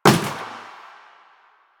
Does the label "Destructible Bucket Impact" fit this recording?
Yes